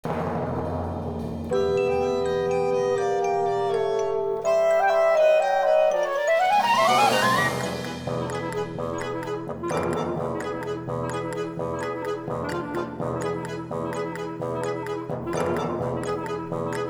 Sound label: BGM Circus; PAPER MARIO Color Splash; wiiu